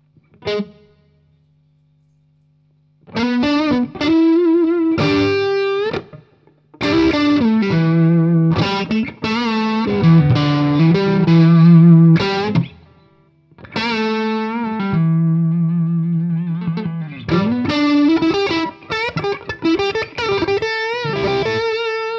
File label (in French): rock treeble, guitare